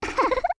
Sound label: Laughing; Midna